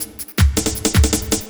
LOOP, Drum & Bass, 160 BPM; Ueberschall; Drum & Bass Resonance

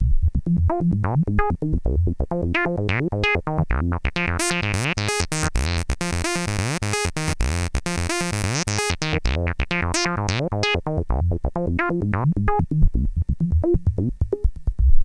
Synth hits and riffs

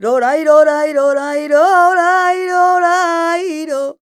voc c#, Flamenco, Vocals, Female Cantaora; Female Cantaora 2

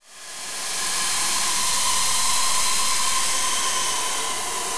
FX Scare, Drums & Drones

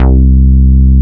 JUNO E-BS C3; Drums In Your FACE!

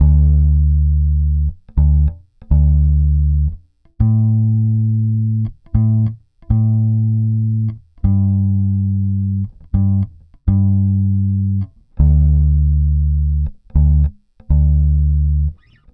Pop, Bass

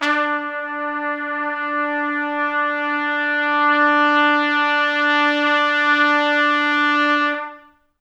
D3 -L, Pop Brass; Best Service; ProSamples vol.19; AKAI

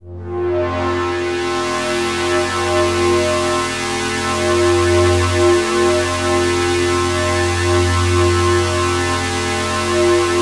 Access Virus B C3; Synthworx

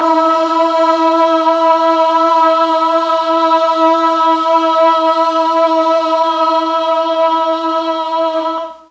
E2 BOYS CHOIR, Mellotron; Mike Pinder - Mellotron Archive CD

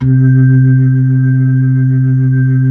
B-3, Blues

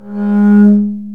STR, Cb Harmonics; Roland L-CD702; Cb Bowed

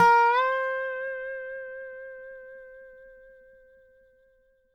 dobro, C5; DOBRO UP

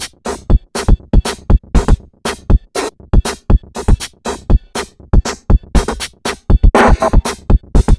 junk hip 120bpm, Downbeat Dance Grooves

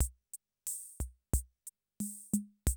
CR78, DrumLoops

